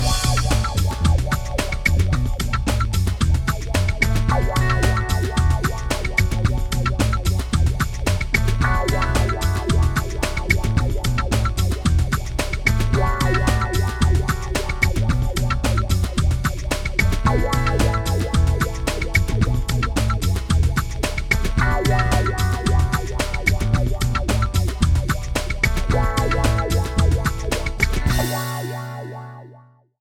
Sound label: Get Groovy, no melody